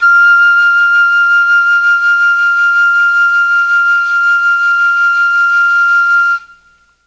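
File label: F4 chamberlin flute, mellotron; CHMBLN FLUTE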